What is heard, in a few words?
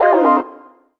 Error; Business skieme Beta 1